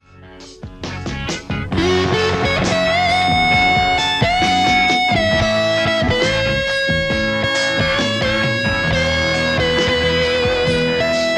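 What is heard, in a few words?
Stratocaster JCM800 hot; mercuriall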